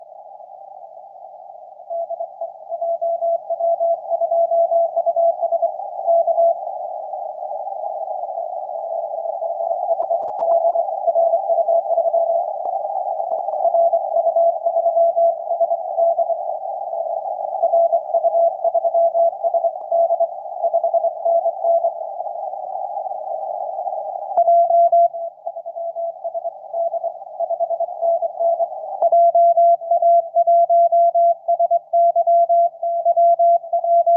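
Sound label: 160m, signals on 160m band; jw2us; ru3sd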